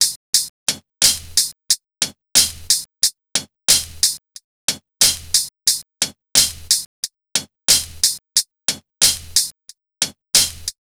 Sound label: DRMS, Pure Electro; USB Soundscan vol.03; AKAI